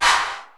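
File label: 808 clap; 480L; Wizoo; Platinum 24 Electronic; 480L GA 808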